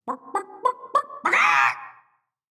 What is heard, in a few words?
Mage Chicken; Realm Royale; Seraphim